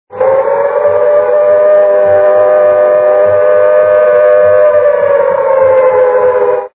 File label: alarm; the time machine